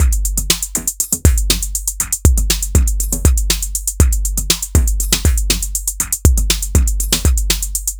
Electro Time Beat, Drum Loop; Sonic Foundry; The Electronic Music Manuscript Collection; Drum Loop Structures

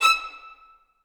E5, Orchestra; AKAI Advance Orchestra Upgrade 97 Vol.1

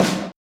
RX8 SD, Yamaha RX-8

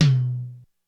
MedTom, Korg DSS-1

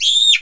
SCREM; sound canvas

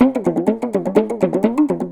PAPAN, Indian Traditions

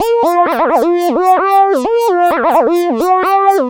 Synths

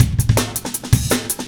Step 162, breaks

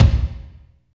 KICK, Tekno Industrial; East West

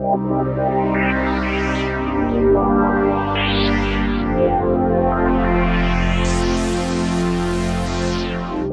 Big Pad; Pads; Dr. Fink's Funk Factory

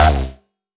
C Bass, DnB One Shots

